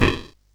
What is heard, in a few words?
SFX; Narco Police